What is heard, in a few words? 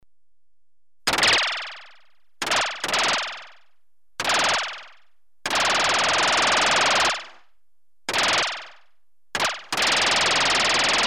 Machine gun rhythm (rapid, series of bursts), Electronically Generated Sounds; Sound Effects Library